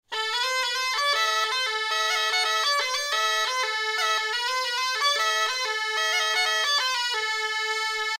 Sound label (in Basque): Alboka melodia 1 LA 120 BPM